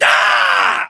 melee charge, voiceovers

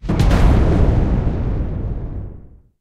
shell explosion; tanks; War Thunder

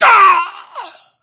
death

